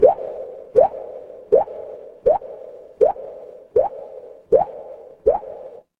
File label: water drip